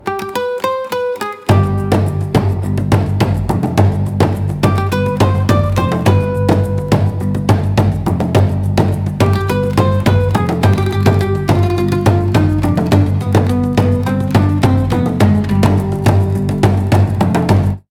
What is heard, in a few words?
Drumming; Infinix; Note-Edge